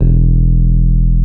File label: E 0, Electric Pianos; Wizoo Electric Pianos